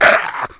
pain

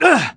pain

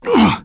pain

